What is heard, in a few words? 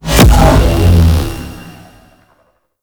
UFO Attack Slam; Warframe; Corpus; Jackal Redux; Abilities